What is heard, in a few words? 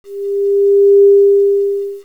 quant noise